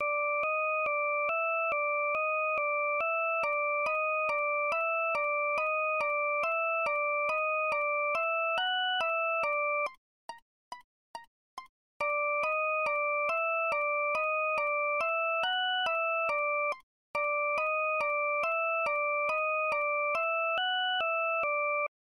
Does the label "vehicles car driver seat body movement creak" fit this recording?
No